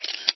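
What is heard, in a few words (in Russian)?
звук фотографирования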